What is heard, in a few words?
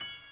piano high